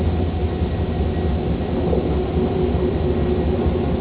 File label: diesel idle; Freight Train